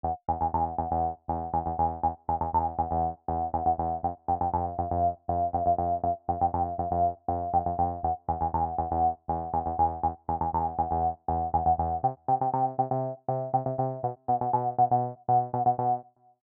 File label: RAGGA MAFIN tb303